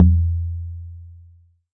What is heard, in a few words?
Jomox MBrane, drum machines; Jomox MBrane 11